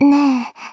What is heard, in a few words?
combo break